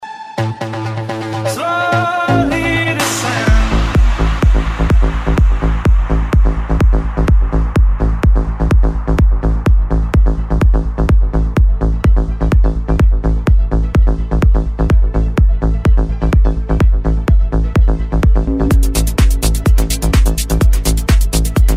progressive techno house

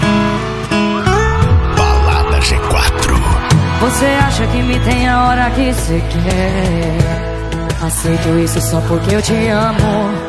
sertanejo; balada g4

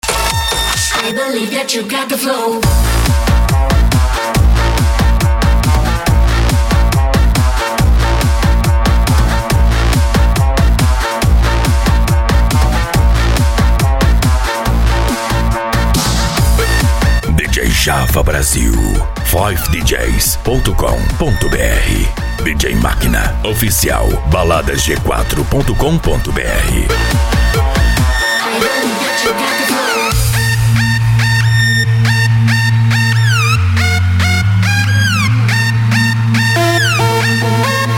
mega electro mix

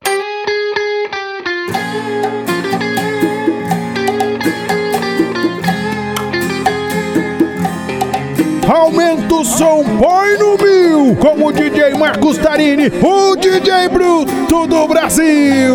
sertanejo; fervo sertanejo vol5

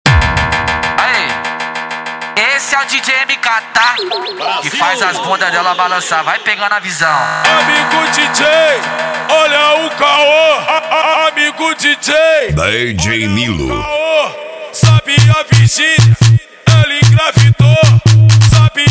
set funk bass

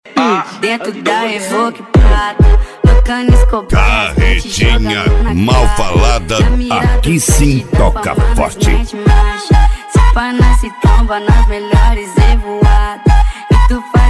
beat

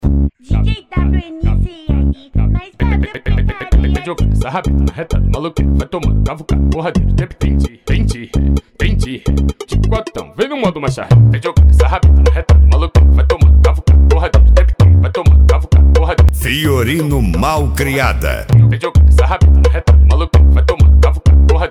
mega funk bass

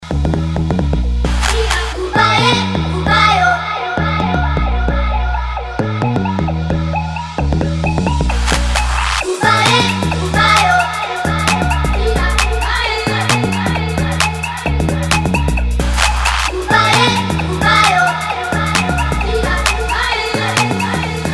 mega electro mix

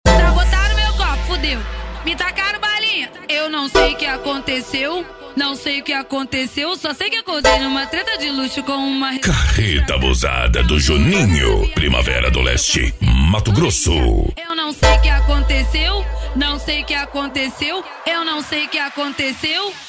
mega bass

